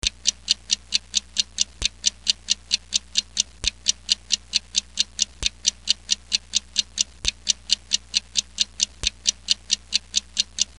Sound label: count down 10 sec to end; Delay No More